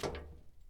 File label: walk metal; Escape from Tarkov